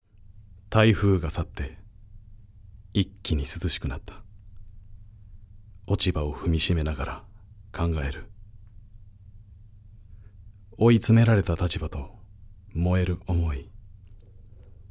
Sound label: bass